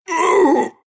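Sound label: pain, Russian; Dota 2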